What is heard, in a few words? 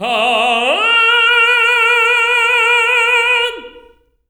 TENOR A#3, Voices; TENOR OCT